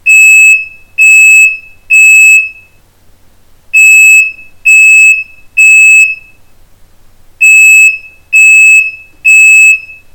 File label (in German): bosch ferion 1000 o rauchmelder alarm